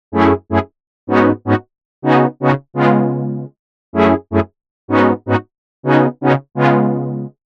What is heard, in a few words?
Synth Brass